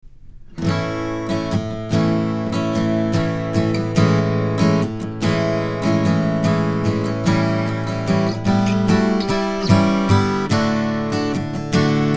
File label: Microphone, Acoustic Instruments Square Shoulder Gibson Acoustic Hummingbird; 扫弦